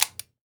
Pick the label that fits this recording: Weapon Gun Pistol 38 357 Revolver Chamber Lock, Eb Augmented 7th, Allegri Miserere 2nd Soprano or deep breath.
Weapon Gun Pistol 38 357 Revolver Chamber Lock